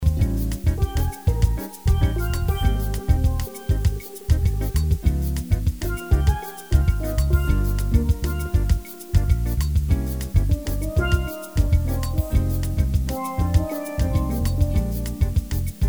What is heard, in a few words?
Steel Drum Soloist